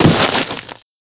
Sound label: Body Impact